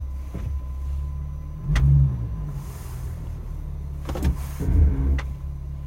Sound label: rear seat creak popping noise, popping noise